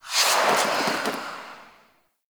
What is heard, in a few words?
Dash Stinger; Warframe; Dante